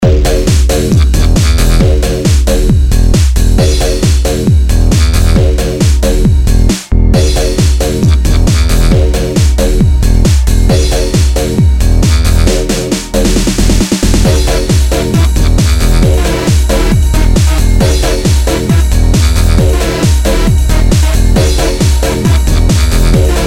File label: techno; Native-Instruments Massive